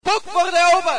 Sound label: axis cap; Source engine